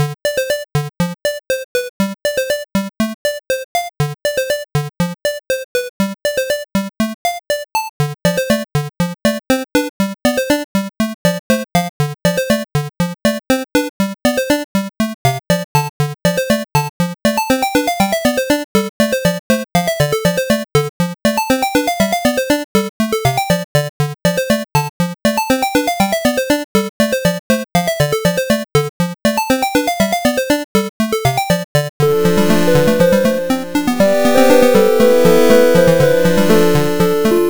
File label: medieval adventure loop